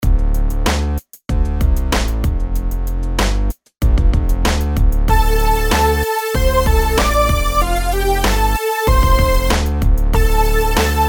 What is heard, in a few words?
rhythms eighths